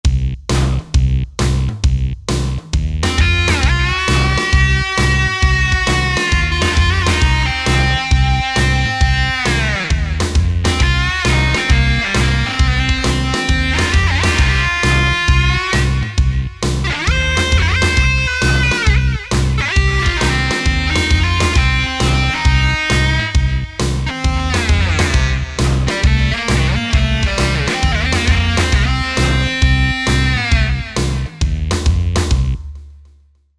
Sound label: Modern Rock